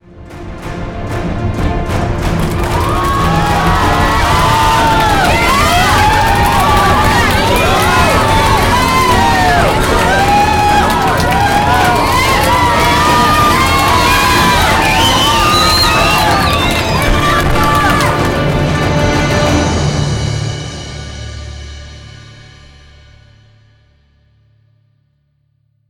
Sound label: final cheers; wikinger